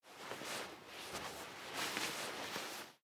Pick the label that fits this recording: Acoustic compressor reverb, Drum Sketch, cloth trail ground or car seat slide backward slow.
cloth trail ground